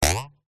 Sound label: cartoon twang bounce ruler pitch rise